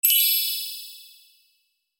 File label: Fairy dust magic sparkles